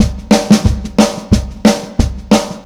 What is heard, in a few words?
DRUM, COUNTRY; Best Service Advanced Media Trax Modern Composer